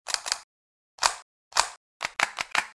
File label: dual g17 reload; weapons